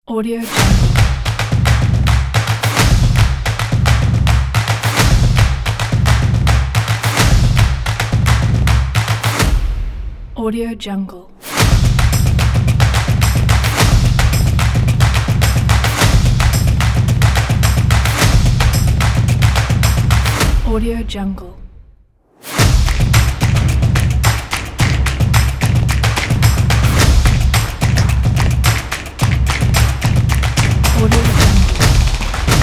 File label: clap